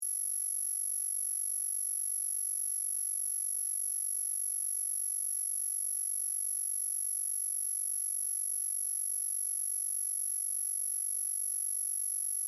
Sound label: Ballast Buzz High, Scifi, Loop; Tech